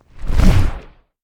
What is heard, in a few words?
Dragon Wing Flap Big; SyrinScape; Hunting horror battle